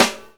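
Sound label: snare drum funk, drum kit; E-Lab; X Static Goldmine 2; akai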